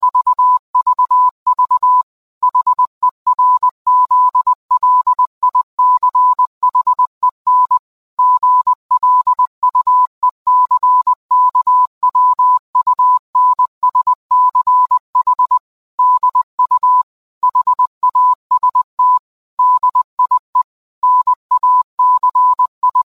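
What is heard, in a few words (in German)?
komische töne; geocaching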